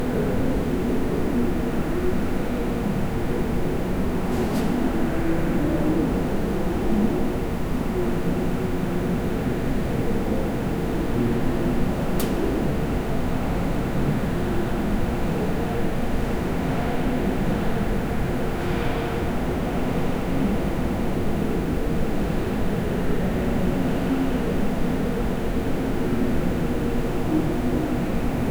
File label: large room ambience